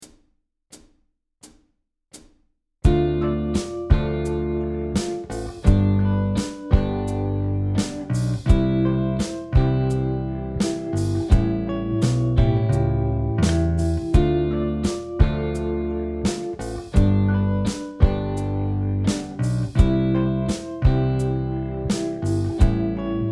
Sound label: Dm7 G C A7 Progression